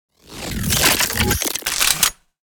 Sci fi blaster gun reload futuristic

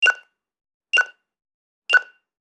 Shopping store checkout scanner beeping

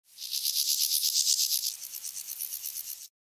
Grasshopper grinding

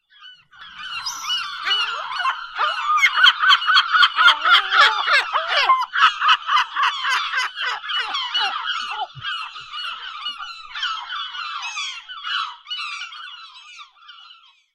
Seagulls calling large flock of seagulls

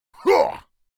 Orc creature fighting vocalization

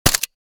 AK 47 assault rifle suppressed shot

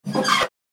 Kitchen oven oven door open; cooking

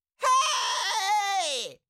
Cartoon little monster voice shout scream long

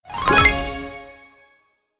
Correct answer game show tv; film and tv; gfxsounds

